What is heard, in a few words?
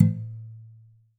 Classical Guitar Damped Pluck; Classical Guitar Extended Technique Stereo